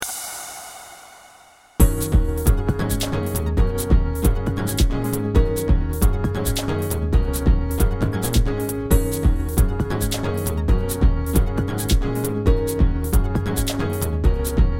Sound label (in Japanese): ジングル01 BPM135